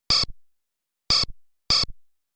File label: Sword Attacking; The Tower of Druaga